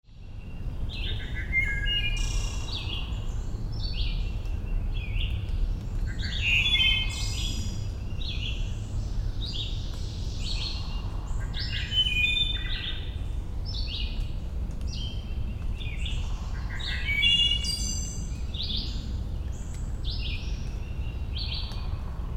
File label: Wood Thrush, Howard